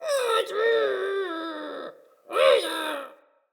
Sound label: Trapped Sprout Vocal; Friendly; Sproutfolk; Fey Hub Sprout